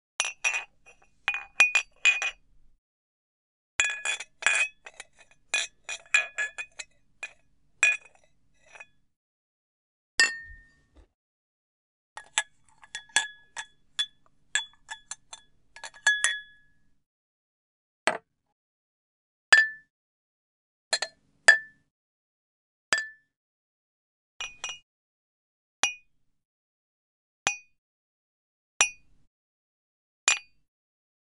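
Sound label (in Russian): звуки чокания на празднике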